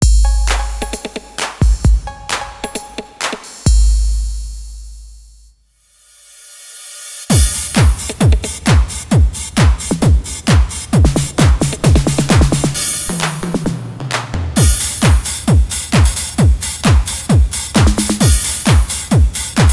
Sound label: drums